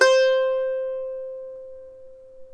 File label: C4 HASAPI; AKAI S6000 CD-ROM; WorldSounds